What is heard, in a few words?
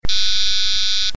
RCX Low Beep